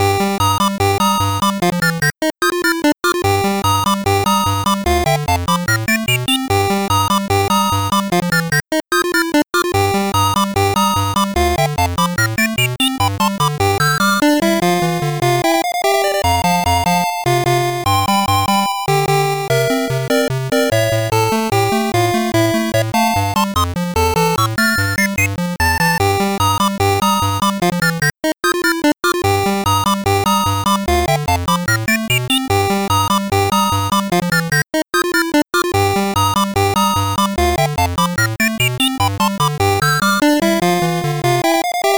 BGM, Cave; super wonder boy; amiga; Round 2, 5, 8, 9, 10

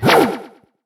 Ogre windup

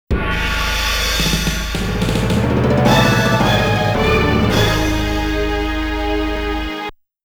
Gravestone (Game Over); Metal Slug

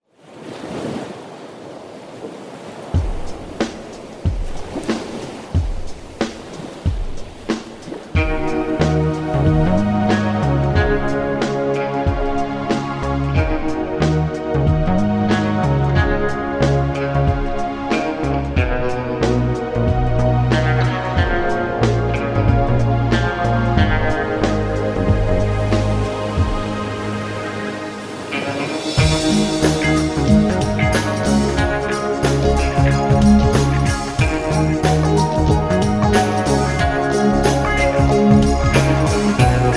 Electro Music; Hoyle Card Games 2005 soundtrack

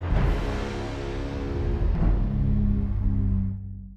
Death; Spider-Man 2 The Game

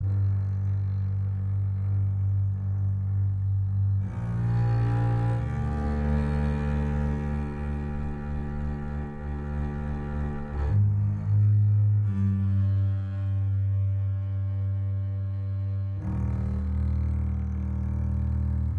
Emotional Loop

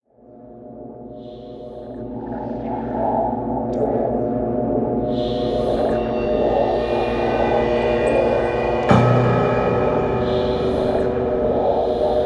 Scavengers Ambient; gamerip; Scourge Outbreak

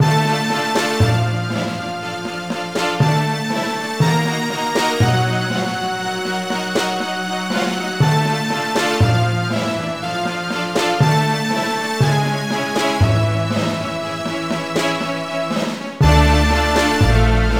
Tune Loop; marie antoinette and the disciples of loki; nds

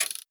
radio dial; adams venture origins; Kismet

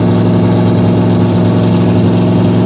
Plimouth Barracuda Low

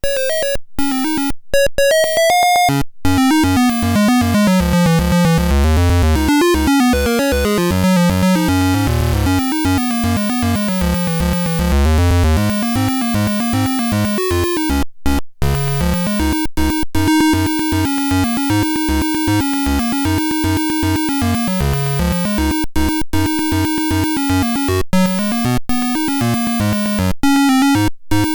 Title (Beeper); Hydrofool; zx spectrum

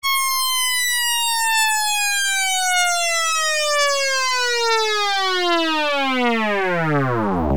Falling SFX; ibm pc at; Cobra Mission: Panic in Cobra City

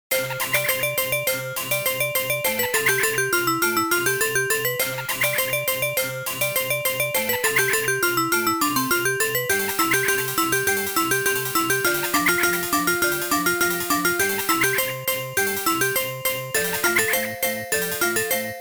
Cembalo Rumba; savage reign anthology gamerip; Carol Ending

